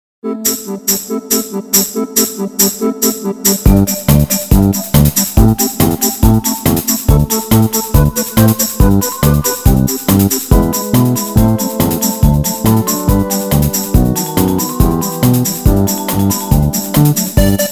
karaoke

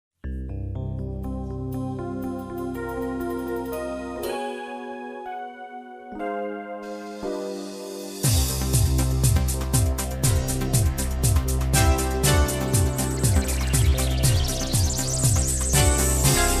Instrumental; kvn

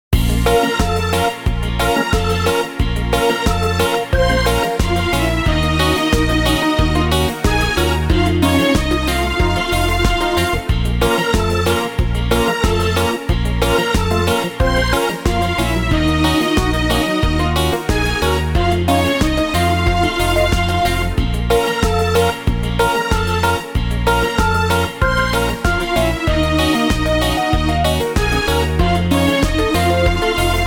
karaoke

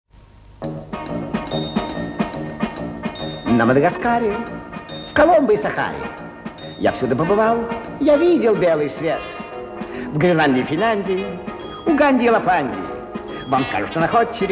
song

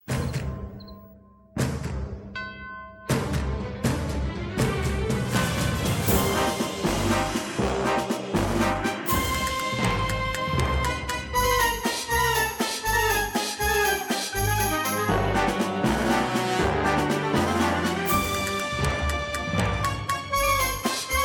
waltz; Master and Margarita

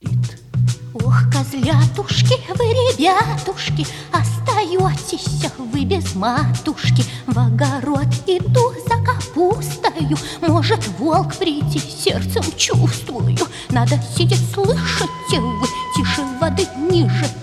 goat song; 7 kids

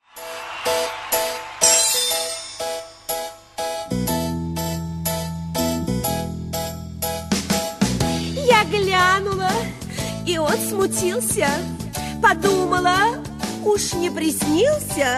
love song; angry beavers

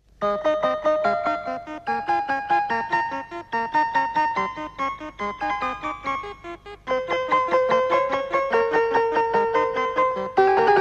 song

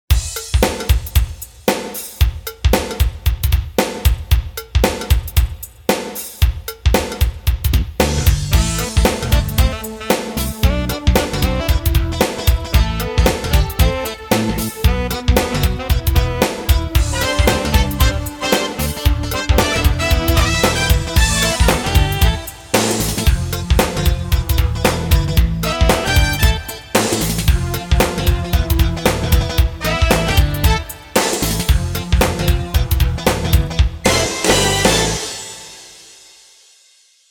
karaoke; Darkwing duck

